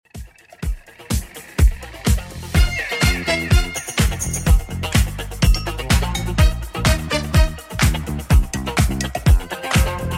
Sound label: 70s Redrum 125bpm; remixplanet